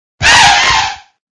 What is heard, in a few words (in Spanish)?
Grito de Cufant